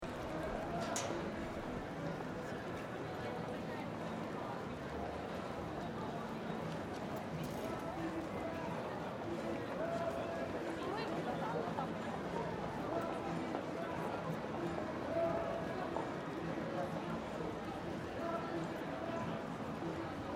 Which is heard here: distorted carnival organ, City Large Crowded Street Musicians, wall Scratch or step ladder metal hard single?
City Large Crowded Street Musicians